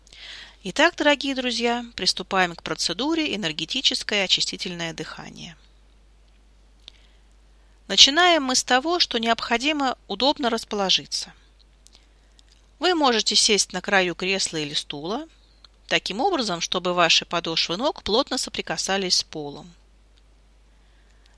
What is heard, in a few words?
cleansing breath